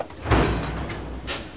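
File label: cutting; RenderOrgan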